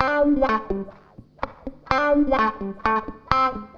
WAH, wah wah gtr

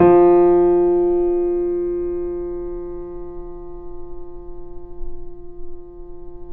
PP F 0, Grand Piano off mic

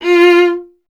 viola F0, Solo Instruments; Viola 2; Miroslav Vitous

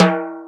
Yamaha CS6

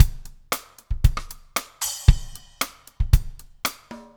STIK

